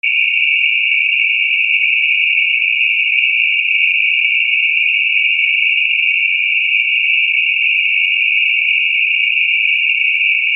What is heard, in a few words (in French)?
bruit rose